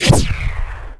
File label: Ear Effect, Grenade